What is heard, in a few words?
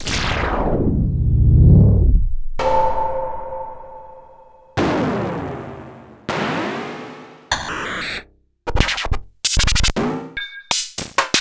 Hat c